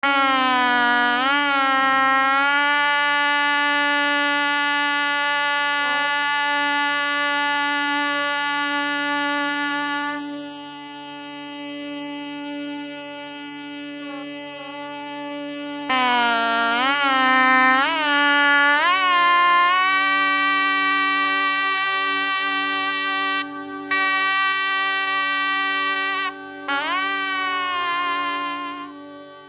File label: instrument shehnai